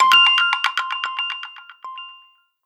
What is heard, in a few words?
Blip, notifications, phonetones, Motorola Moto Z Play